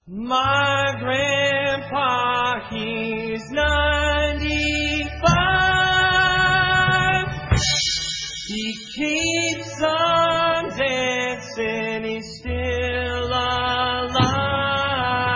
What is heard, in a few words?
Polka